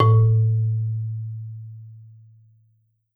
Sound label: Marimba A1; Sampleheads - Dave Samuels Marimba & Vibes